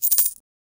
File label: coins shaking in a bag; 16 bit